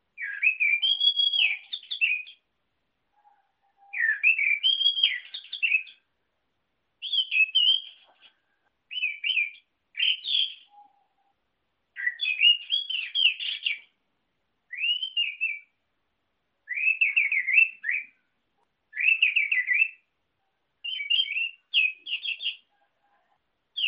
anis merah kicau

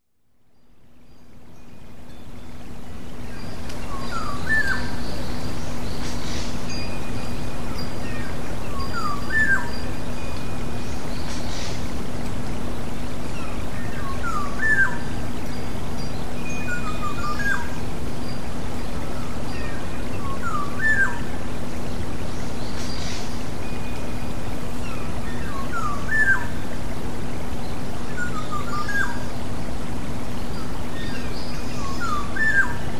terapi alam kepodang

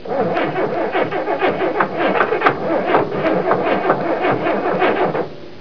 suara mobil

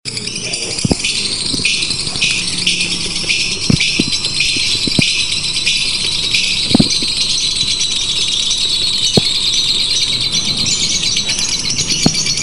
simulasi lomba lovebird

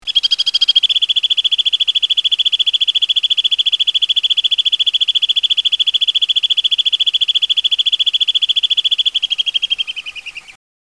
walet alpine

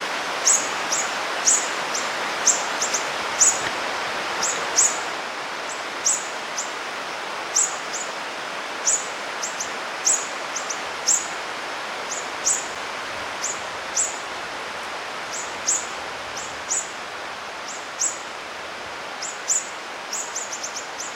suara cucak rawis

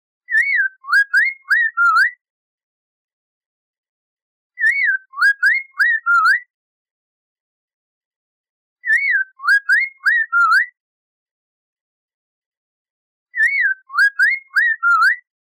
suara poksay mandarin variasi

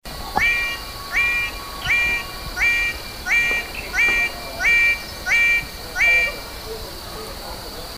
suara cenderawasih biru paradisaea rudolphi

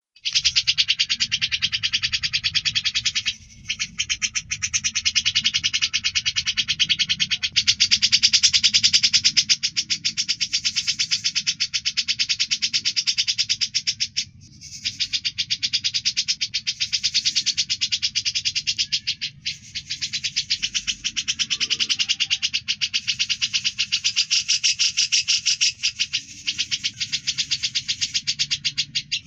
suara cucak jenggot isian

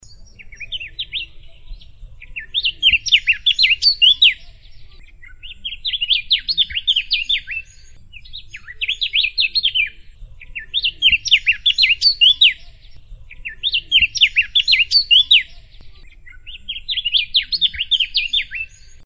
suara blackcapped speirops

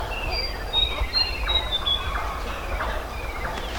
suara burung sikatan

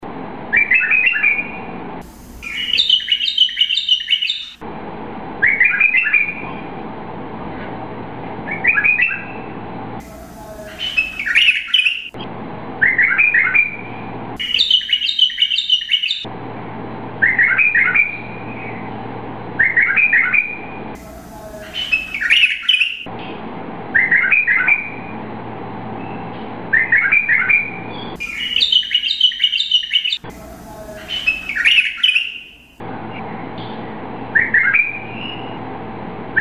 kutilang irak

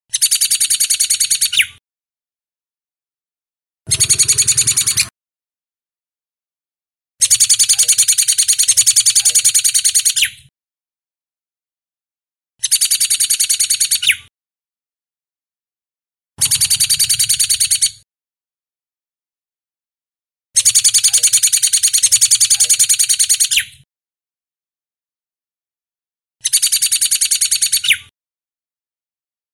Suara burung awar awar aka srigunting jambul

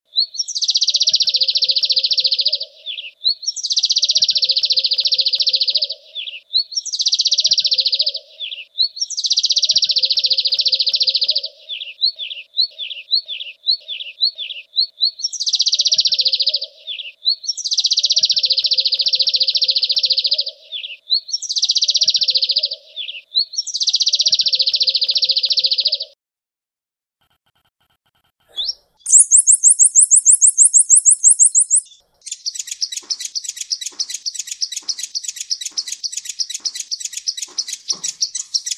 kombinasi kolibri pilipina konin

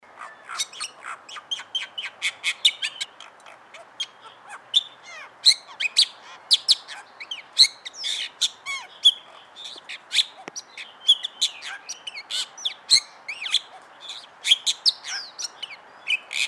suara jalak bali

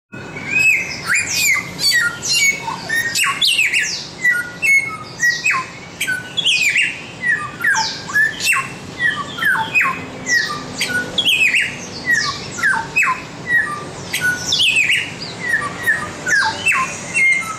masteran samyong

